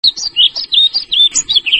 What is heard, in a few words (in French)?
Gobemouche Noir; Passereaux; Les Muscicapidés; Gobe-Mouches